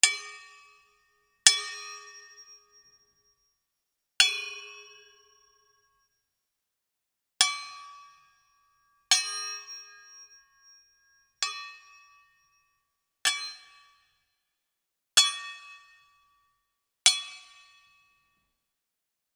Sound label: sword